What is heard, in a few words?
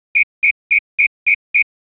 police